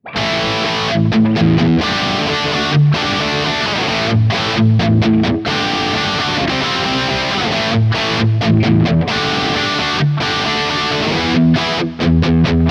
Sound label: DC DLX Highgain Rhythm Humbucker; Harley Benton